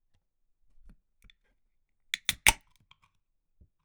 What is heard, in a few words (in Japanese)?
缶を開ける音